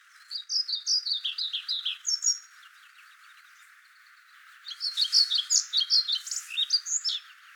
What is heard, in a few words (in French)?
gobemouche noir